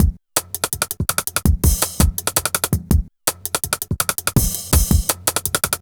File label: Stick Break 165, Drum Loops, Jungle; Jungle Warfare 1&2